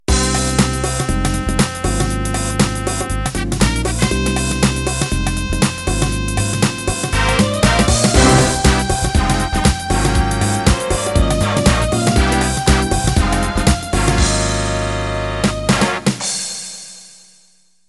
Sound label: House Garage Intro